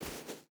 footstep barefoot sand jump land; Rust

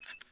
Open Lunch; Civ4 warlords

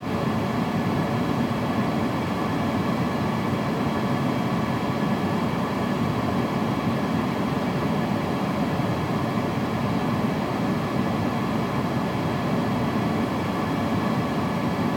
ship engines; World Of Warships

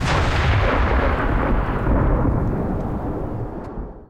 20 23mm tail far

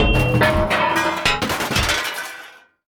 combat atmosphere; World of Tanks

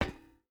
footstep boots metal walk; Rust

